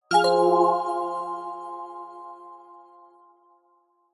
multimedia game sound zen game chime negative soft